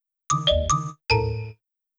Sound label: Jingle Bad Response